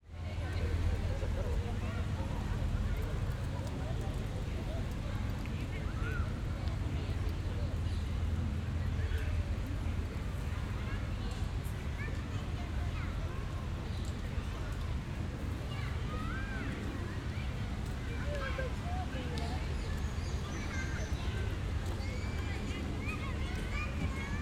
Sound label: Istanbul Gulhane Park XY